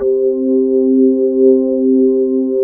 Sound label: Mid Slow A4, B3; SoundFont - The Developer's Choice - Volume 1